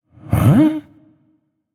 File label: notice; smite; gilgamesh